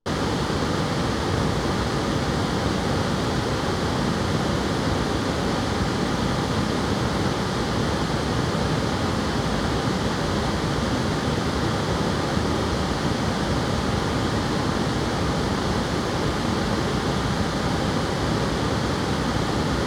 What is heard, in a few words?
test ref moving; SpatialSourceMapping